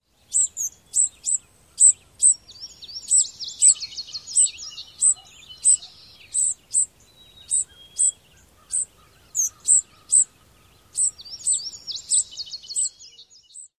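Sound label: Indigo Bunting zeep calls; NY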